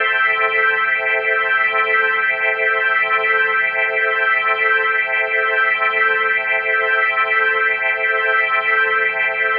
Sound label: Medical Organ A Min7 and 8va; Minor 7; The Vitale Organ